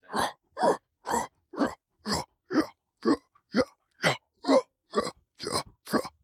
Ruins Sentry Patrol Loop

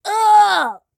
Flyer Death; Col Flyer Death

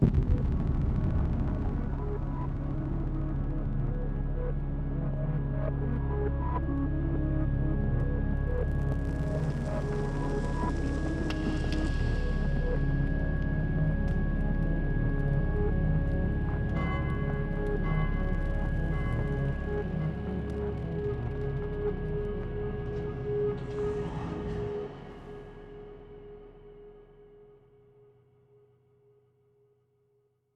boss music Hall Ambience loop; CP boss music Hall Ambience-loop

